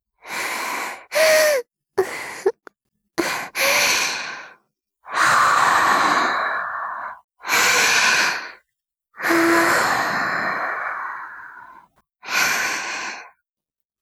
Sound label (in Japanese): 耳舐め, ASMR; ＳＥなし